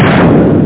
double cannon shot; 8-bit Sampled Voice; 8,363 sample rate; 0.09 seconds

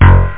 hbass

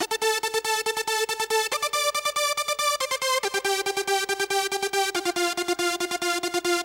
Tranc, Melody; Techno Music Maker

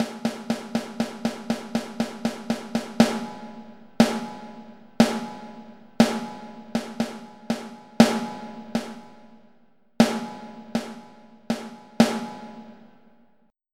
Kick; KickNT & YamMaple; Onj's Soundfont

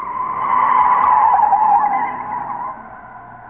SCREECH, Slot Car

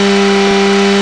decel; Superbike 2000

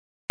PUSH; KARUTA

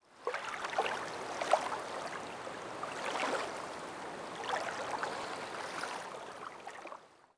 WAVE, water

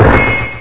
Sword defeat; Net Power 1999 #12

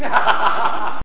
laff

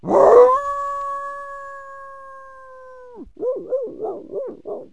dog howl; animals; Wired for Sound Pro CD; Aristosoft, Inc.

